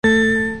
Electric Sound; Music

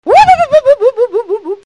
Wub Wub

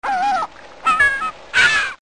Gulls; Animals

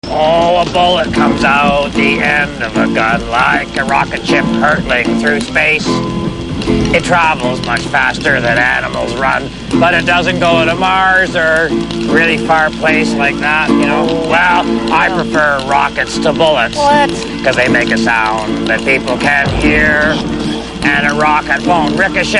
Camp Fire Songs; RedGreen

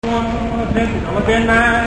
Auction, Crowds

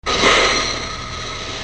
Drilling Rig, machines

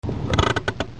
Emergency Break; Machines

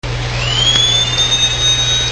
Dentist Drill, Machines

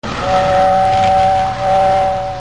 Toy Train

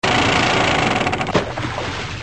Anchor Lowering; Machines

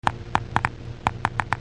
Geiger Counter; Tools

